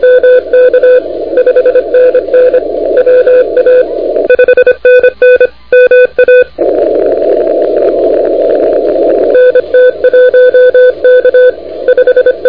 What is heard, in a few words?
CW 28MHz; ARRL10M